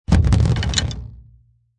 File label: Cartoon Drum Drop Stick On Drum, Drop sticks on drum: Messy drop; Comedy/Cartoon; Funny Sounds